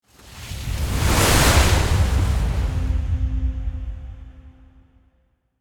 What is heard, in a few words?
Cinematic water transition